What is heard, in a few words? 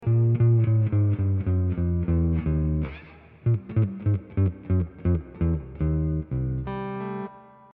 hawaiian piece